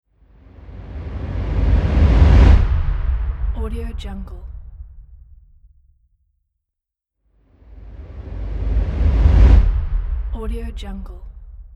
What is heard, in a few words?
force whoosh